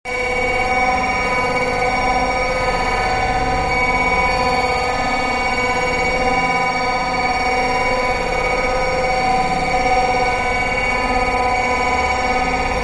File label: Mainframe-Room-Granular loops in alternating loops